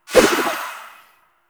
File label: Kunai Whoosh, Weapons; Warframe; Choir